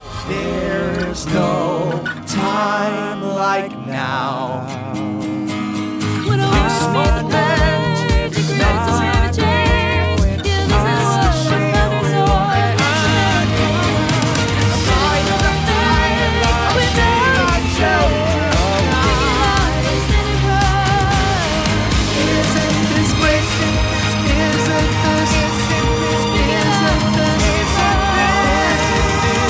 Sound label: Triple Song